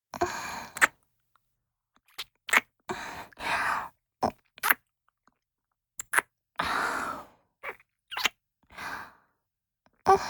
キス(弱)：唇同士が触れ合う軽いキス

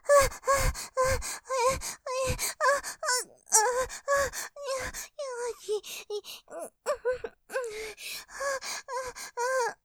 喘ぎ ふえぇ ふぁ 感じちゃう系 セリフ無し, ASMR; モノラル; ループで楽しむ！シェフの気まぐれ抜き抜きコース

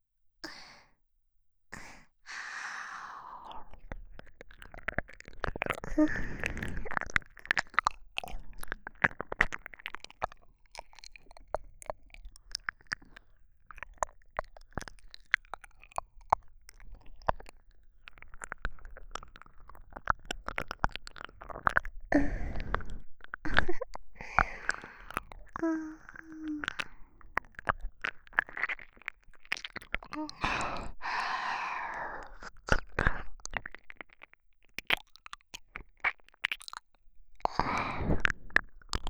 好き好き 耳舐め; お姉さんの耳舐めがないと僕はもうダメみたい。

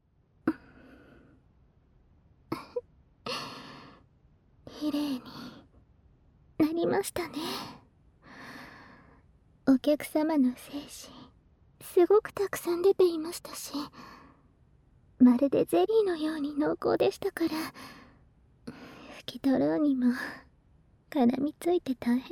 耳、乳首マッサージ; 出したてお〇んちんもフル勃起！？

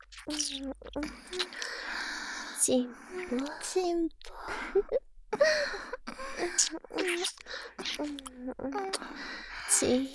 キス; ちんぽ; L文R名月; おまけ4（文×名月）

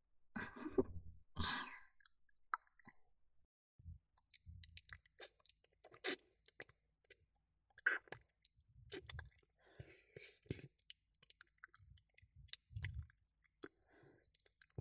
トロトロな耳舐め

